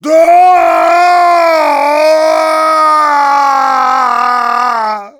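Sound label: Heavy scream falling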